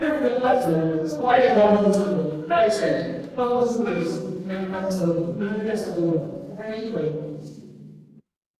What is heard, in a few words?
crowd murmur